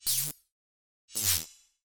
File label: Socket Connect Disconnect